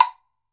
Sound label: BLOCK, Brazil Drum Perc; E-MU Producer Series Vol. 7 – Old World Instruments